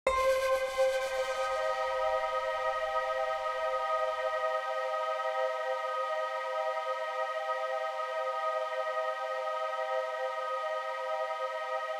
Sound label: ambient